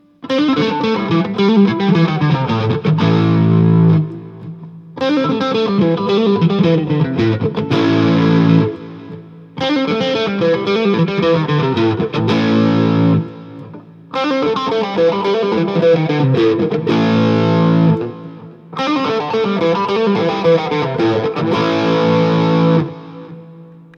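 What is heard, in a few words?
Guild S284 EMGs Backline Riff, guitar; Guild S284 Aviator with EMG pickups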